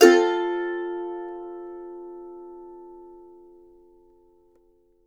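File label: MANDO MAJ F major up, Left; Sampleheads; Whole Lotta Country 1 & 2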